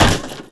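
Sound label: wood pole small, Impact; Cars; XBOX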